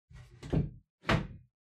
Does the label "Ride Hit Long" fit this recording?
No